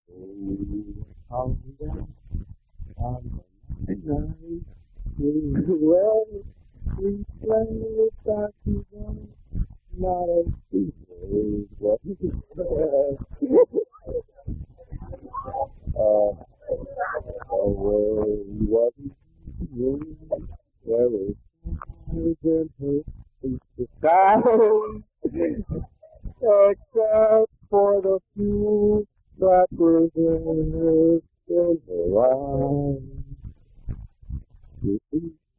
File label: Male Vocal